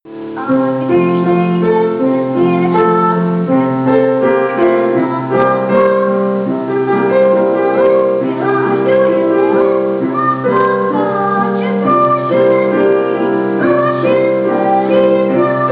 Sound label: vocal